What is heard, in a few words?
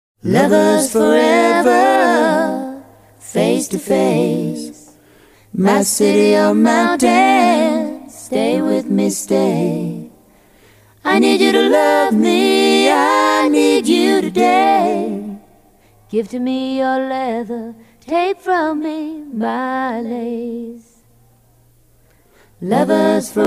Acapella Duet Chorus